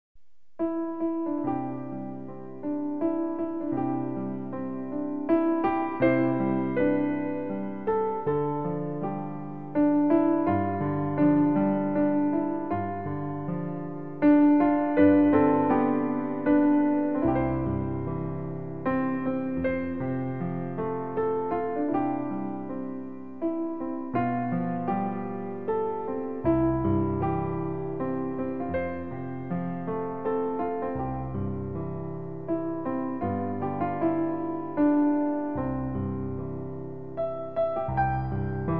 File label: Brahms Lullaby Piano